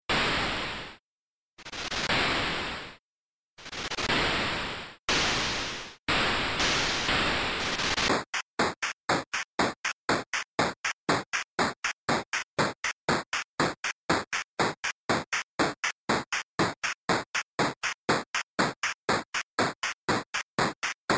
Silly March only bass

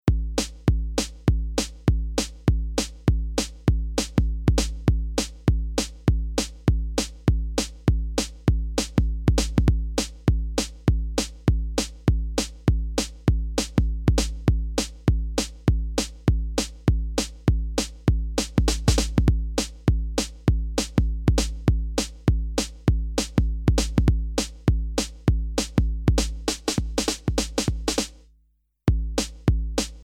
only bass

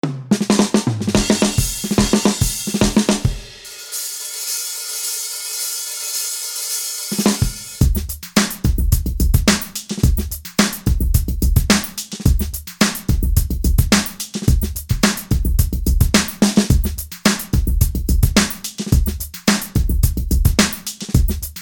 Only Bass, funk

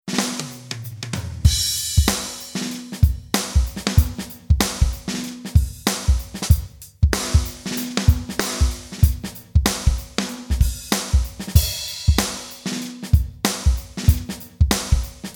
Only Bass, funk

